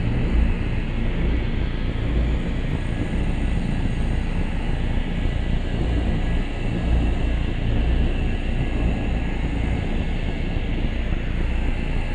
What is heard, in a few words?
ship shroud seal idle; Stellaris